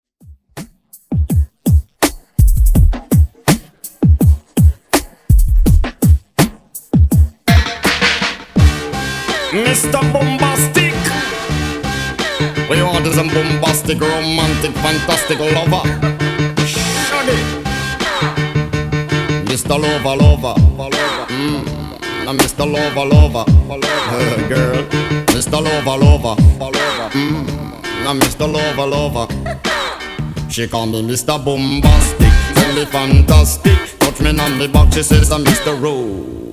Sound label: Re drum